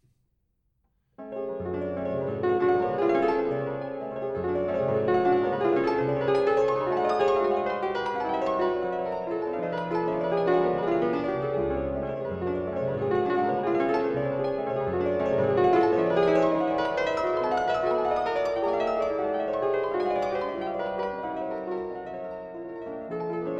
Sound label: Preludes Op 28 - No 8 in F sharp minor, Piano; Frederic Chopin